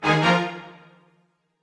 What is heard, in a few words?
Battle Strike